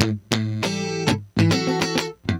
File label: Electric Guitar, Loops; Guitars